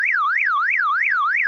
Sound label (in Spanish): bocina de micro